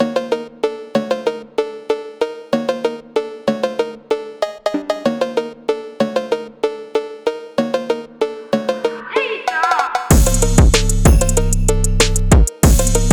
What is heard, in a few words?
Phonk Beat 190bpm